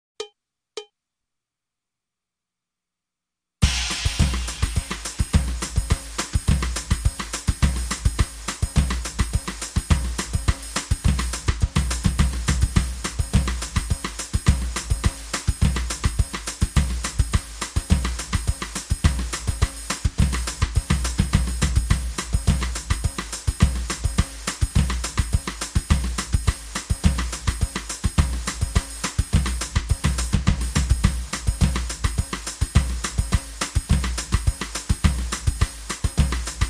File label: Samba Loop Drum Kit Only